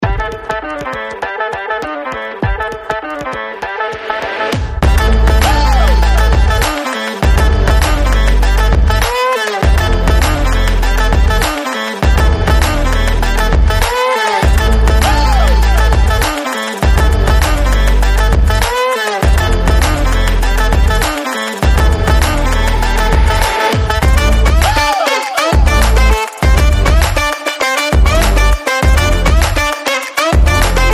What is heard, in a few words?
bounce